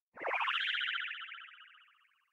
appear

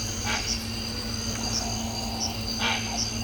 Goliath-heron, پرنده غول حواصیل

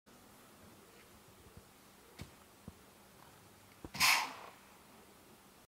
Red brocket

